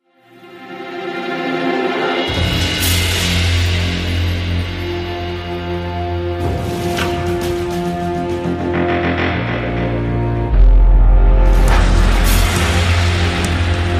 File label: Trailer Theme Song; Missions of the Past SPRPG; Role Playing Game